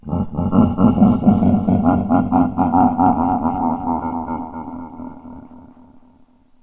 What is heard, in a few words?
devil laugh; monster